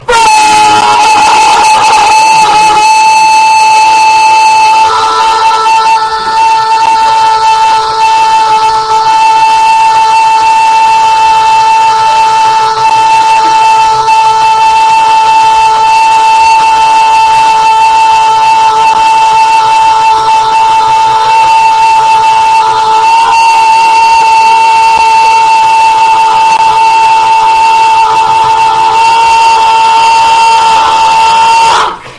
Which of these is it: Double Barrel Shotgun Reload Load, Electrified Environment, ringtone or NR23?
ringtone